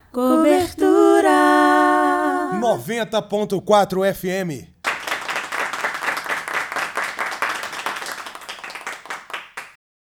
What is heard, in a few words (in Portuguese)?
vinheta